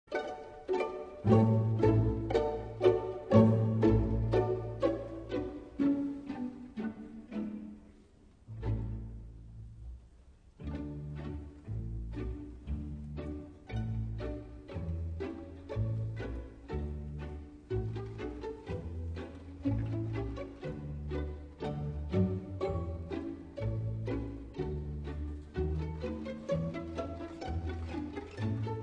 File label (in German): Mandolinen Serenade